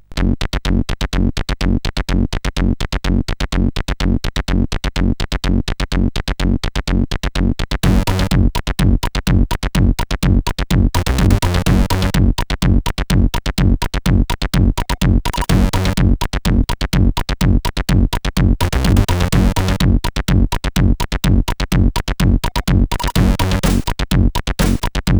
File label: Loading sid CSG8580; hvsc